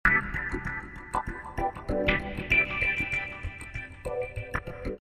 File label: Swinglefunk C